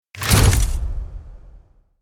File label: Cinematic camera flash shot